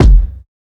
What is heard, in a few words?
kick heavy; Drum Sample Pack; One Shots; Slate; SEISMIC